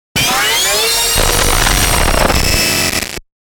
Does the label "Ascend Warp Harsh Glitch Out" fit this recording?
Yes